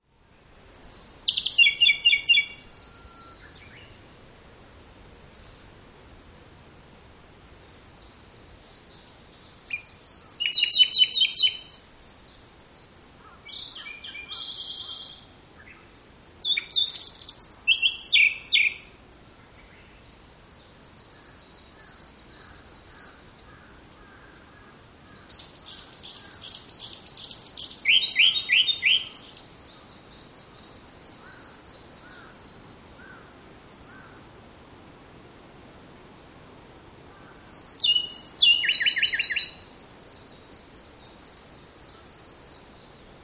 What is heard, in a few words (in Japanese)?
hontouakahige call; yanbaru